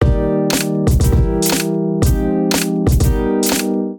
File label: Kit; Road Monger Kit; Ableton; Beat Tools